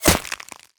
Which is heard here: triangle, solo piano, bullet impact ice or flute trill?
bullet impact ice